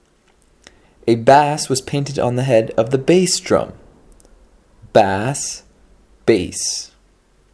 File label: bass